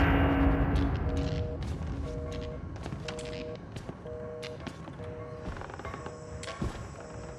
footsteps

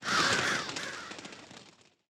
atm crows takeoff; pineview drive

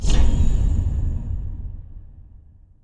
ui confirm; Dark Souls III

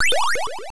pickup upgrade; castlevania

